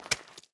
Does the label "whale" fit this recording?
No